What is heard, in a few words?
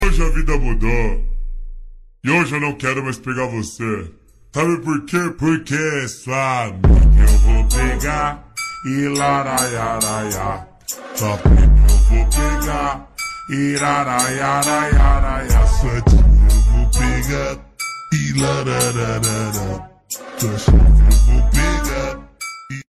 Funk